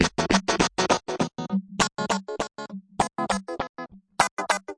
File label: 100 guitar chipper echo; GUITAR LOOPS